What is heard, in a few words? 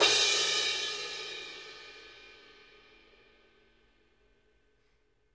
crash, crashes